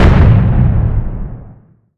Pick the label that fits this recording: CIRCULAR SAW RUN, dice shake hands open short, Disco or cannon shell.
cannon shell